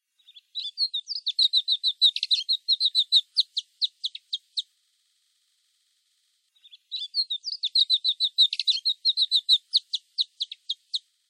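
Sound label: Alpine Accentor; iwahibari; fuji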